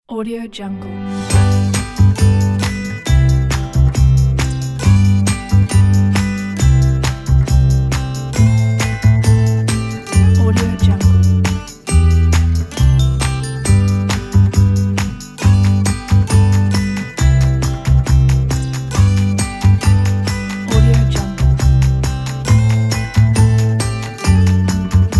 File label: happy morning game